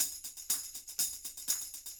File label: 120 tam hh cp